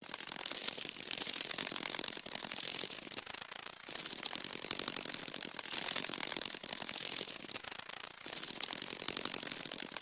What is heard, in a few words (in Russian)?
zhuk skarabey letit; nasekomyh v prirode